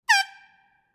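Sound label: sounds of football horn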